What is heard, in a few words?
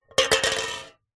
foley cans soda 200ml 2x empty knock over on concrete; zapsplat